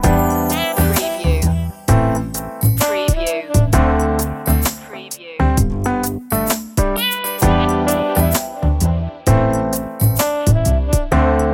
sand and sun loop

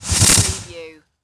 Magic Wand Blast

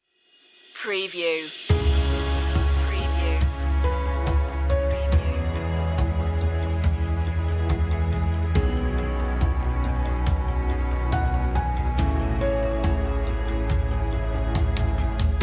epic strings

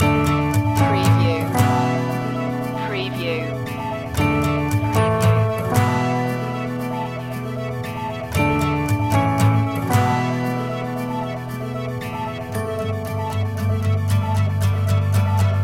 good morning guitar loop

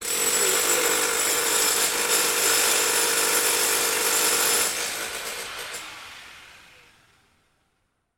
rattling construction tool

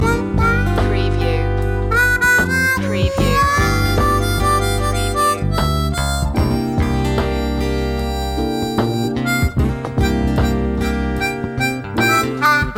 blues loop

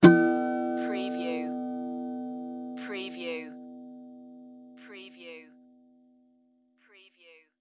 Cartoon Guitar String Hit

